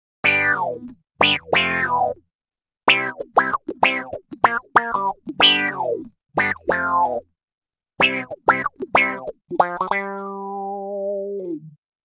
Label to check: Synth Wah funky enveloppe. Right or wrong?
Right